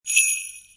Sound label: JINGLE BELL